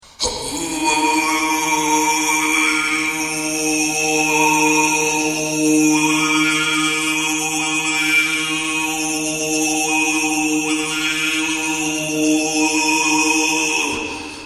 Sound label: borbang, khoomei